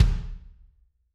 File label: Kick Hard, Drums